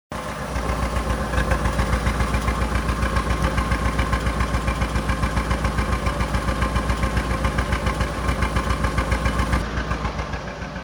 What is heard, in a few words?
idoling issues saturn before gasket change; saturn s series sedan